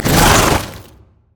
plant enrage; Zyra; LoL

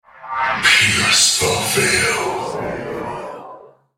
ultimate effort; CyberNocturne

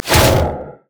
frost flamebreath; LoL; shyvana

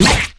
decayed basic attack; LoL; yorick

